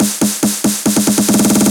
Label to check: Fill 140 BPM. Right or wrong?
Right